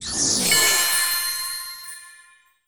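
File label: Card Level Up Success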